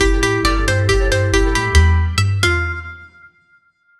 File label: short rhythmic jingle fo